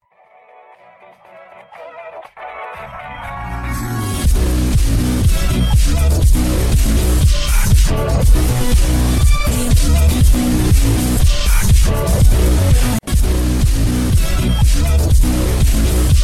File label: DUBSTEP